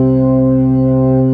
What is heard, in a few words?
B3 C 3-3, ORGAN